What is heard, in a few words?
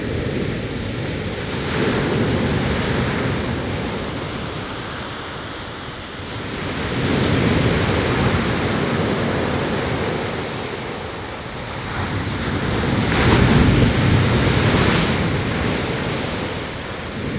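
waves coast loop; ambient soundscapes; dota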